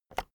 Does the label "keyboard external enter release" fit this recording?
Yes